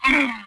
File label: Camel Hit Vox; Civ4